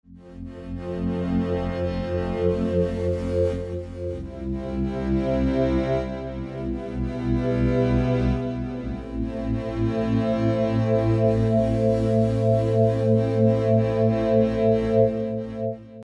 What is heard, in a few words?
Keyboard